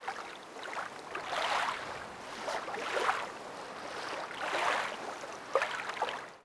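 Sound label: lake loop; Star Wars Obi Wan; XBOX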